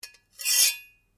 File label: Blade Contact Scrape Medium, Foley sounds for melee weapons; Scrapes; Objects